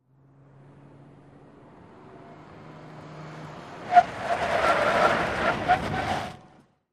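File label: SUV Truck 180 Rev Skid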